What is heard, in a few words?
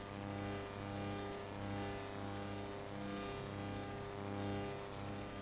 Battery Indicator Machine On Hum Loop